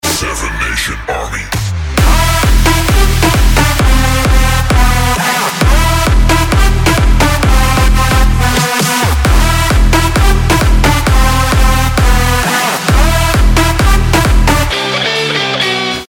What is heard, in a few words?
BEAT